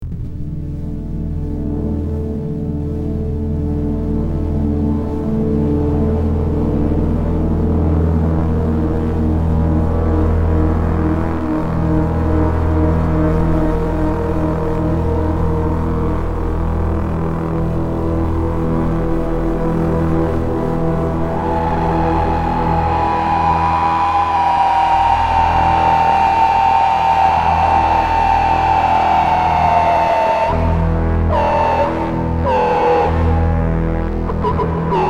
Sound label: PAD Hells Drone